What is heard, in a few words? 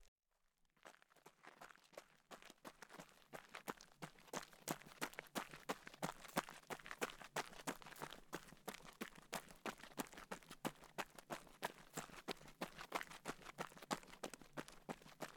Jogging